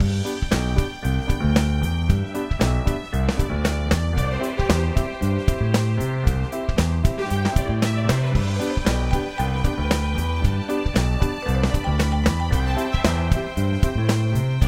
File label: comic; Parking Dash